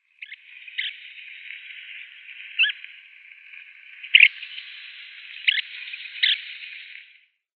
Long-toed Stint calls

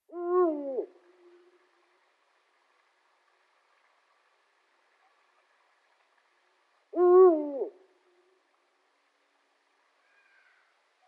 Eurasian Eagle-Owl pair display

